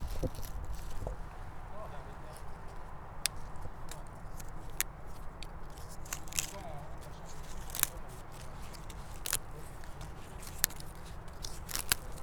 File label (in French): brindilles, campus universitaire de la bouloie